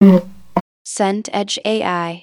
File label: throat clear